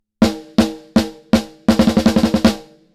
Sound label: snare